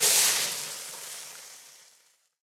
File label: extinguish campfire; STALKER; IWP